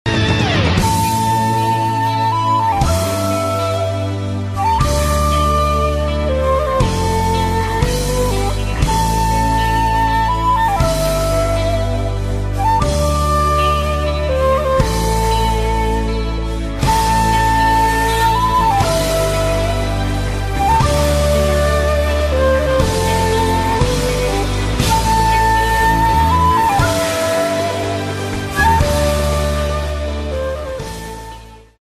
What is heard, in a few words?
naruto theme ringtone naruto flute music; naruto theme 2